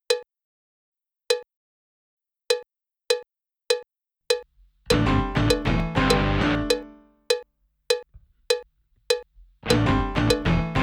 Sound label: right click; Drum Paradise